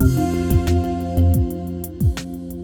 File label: Synth Brass; BB 2HTC Samples Vol 4